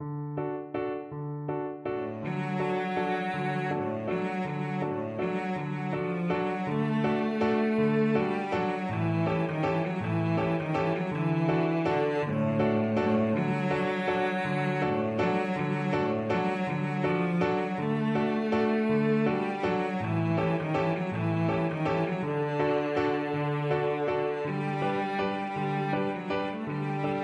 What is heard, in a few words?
traviatta, cello; verdi